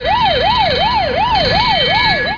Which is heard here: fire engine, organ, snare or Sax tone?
fire engine